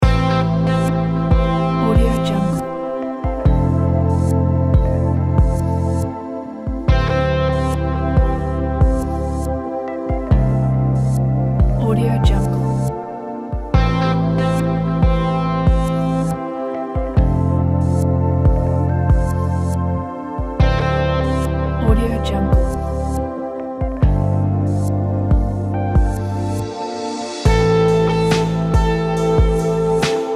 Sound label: erotic music sea breeze